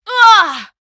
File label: Female Fight Death